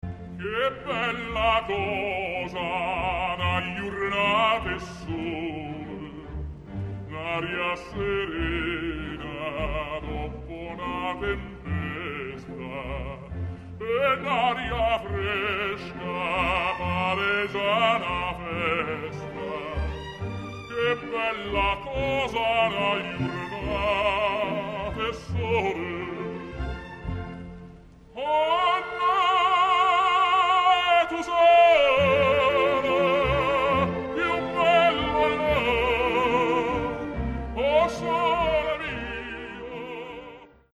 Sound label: basse; O Sole Mio